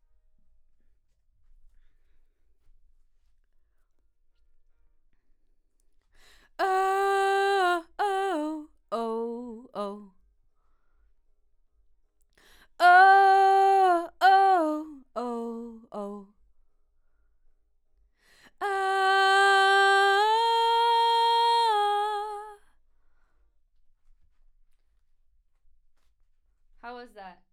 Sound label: Vox start new